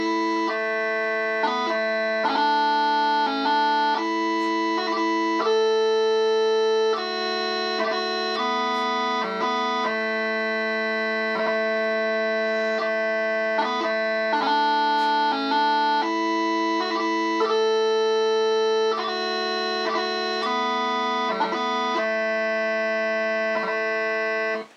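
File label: bagpipe tunes; Sky boat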